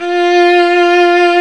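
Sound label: F4 VIBRATO VIOLIN; Northstar - New Gold VOL-2; ORCH.COMP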